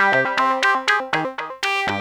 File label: synth